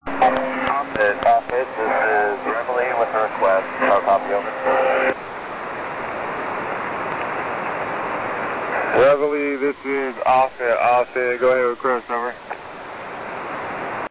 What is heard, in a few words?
reveille